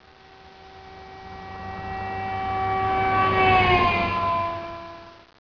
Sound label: doppler car horn